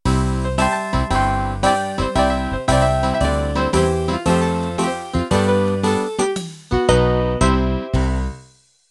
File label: Country Ballad End